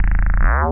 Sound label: Alien Creak